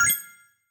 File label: confirm, tones; inteface sfx pack 1